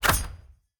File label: scorpion attack claw; smite; npc